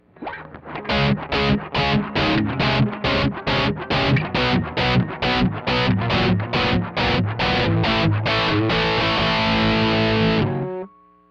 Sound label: dry